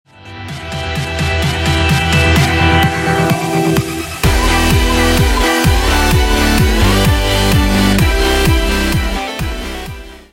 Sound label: drop; canivores